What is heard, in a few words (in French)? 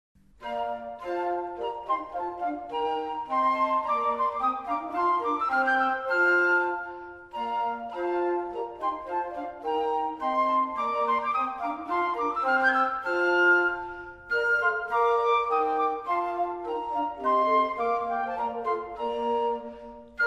Air pour les flutes d'Allemand; Marin Mersenne; La Bergère